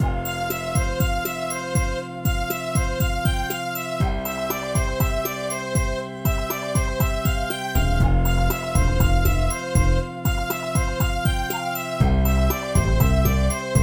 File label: SHORE BPM120; Scribblenauts